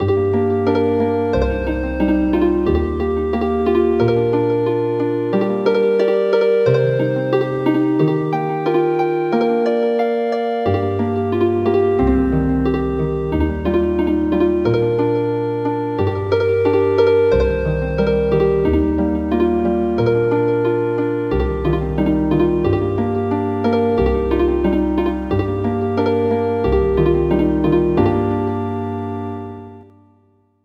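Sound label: lullaby Healing Harp; traditional; Thai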